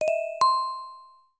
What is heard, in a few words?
Jewel, notifications; Samsung Galaxy A5 2014